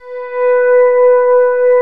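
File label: B STEEL GUITAR; Northstar - New Gold VOL-1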